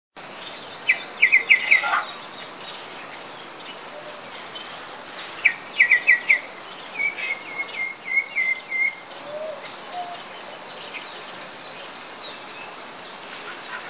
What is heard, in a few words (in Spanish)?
voz anambé común